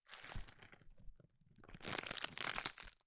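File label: lettuce leaves sounds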